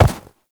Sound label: Step Snow, Movement; Uriel; Warframe